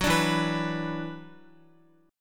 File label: E 7th Suspended 2nd, Guitar; E7sus2; strummed